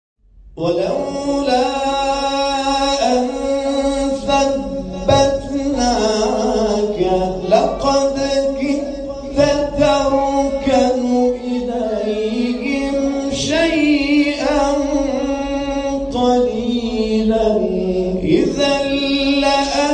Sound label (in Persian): تلاوت زیبای آیات سوره اسرا; 74 75